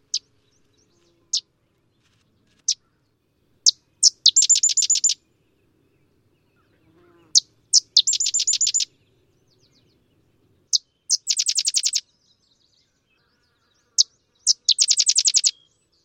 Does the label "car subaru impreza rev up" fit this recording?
No